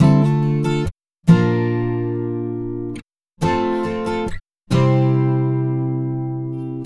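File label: Acoustic Pop Guitar 140BPM D minor; Vengeance - Essential Clubsounds Vol.4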